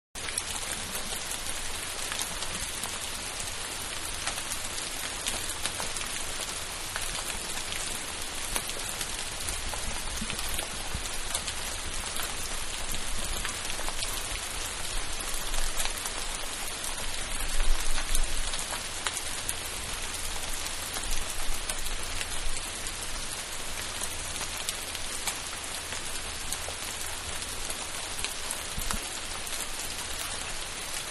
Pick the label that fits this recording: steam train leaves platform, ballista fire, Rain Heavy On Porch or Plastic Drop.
Rain Heavy On Porch